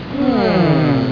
hmmm

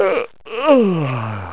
yawn

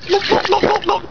drinking

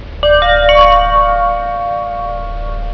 CHIME Bells

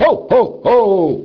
hohoho

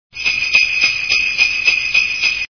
sleigh bells

